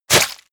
hit blood light

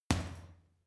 boxing punching bag uppercut medium

